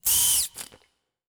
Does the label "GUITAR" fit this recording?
No